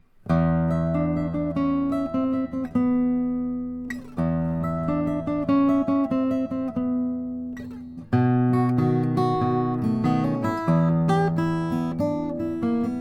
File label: martin 0 18 mahogany martin 0 18 bluz; 1944